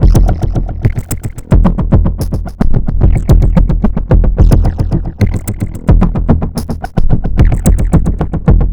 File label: Drums Strange